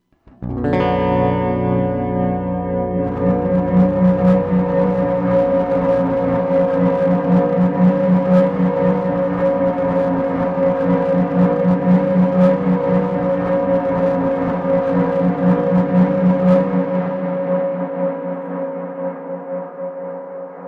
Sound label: Keeley Hydra Freeze